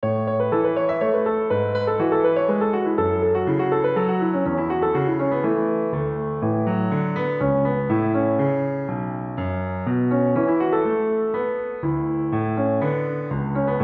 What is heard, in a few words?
solo, piano; Agnyaathavaasi